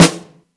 bright steel snare drum e key

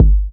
studio kick drum sound g key

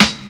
sparkle snare one shot c sharp key; snare drum samples

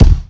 fluffy kick drum sound d key

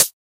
old school mellow pedal hi hat sample e key

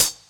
muted hat one shot c sharp key

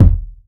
dope steel kick drum sound d sharp key

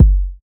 solid urban bass drum single hit g key

